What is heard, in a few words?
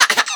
bug